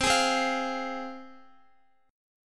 C Suspended 4th Sharp 5th C, Guitar; Csus4#5 strummed; x,x,10,13,12,12

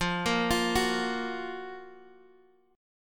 Gb Major 7th F, guitar

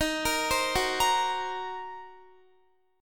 D sharp Minor 6th D sharp, down, Guitar; D#m6; arpeggiated; 4E,4A,5D,5G,5C,6F; chord shape

